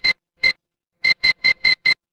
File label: ui timer